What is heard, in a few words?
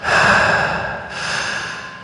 Sfx Basketball